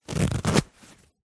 foley clothing jeans denim pants trousers fly zipper; zapsplat